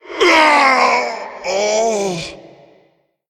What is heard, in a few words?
Living Azerite Elemental Death